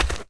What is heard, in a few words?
FS Dirt; kult